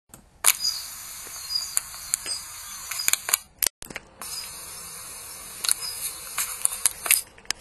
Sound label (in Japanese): ジョウビタキ